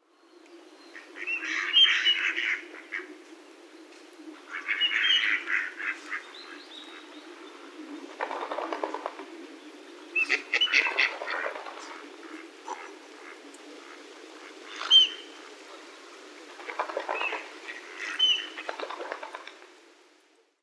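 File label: Mallard; magamo; yoshimi; koe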